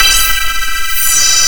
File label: Ptch Pad